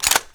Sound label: f2000 forearm, weapons; garrysmod